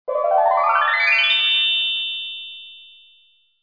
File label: turnplate Draw End